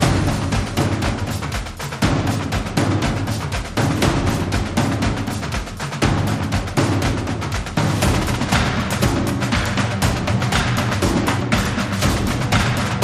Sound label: Beat The Drums